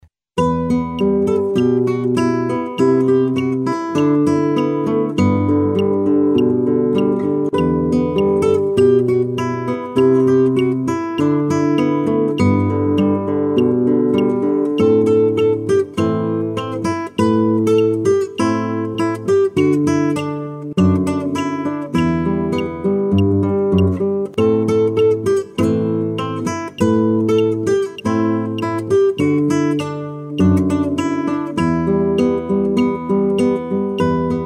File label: guitar